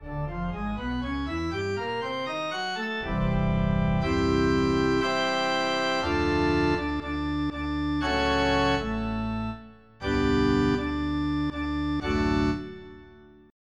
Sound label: Church Organ; MUSIC